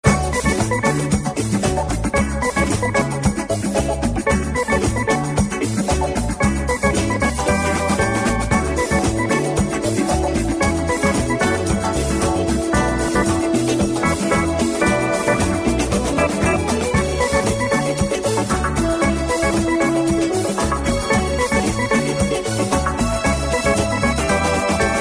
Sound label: best tone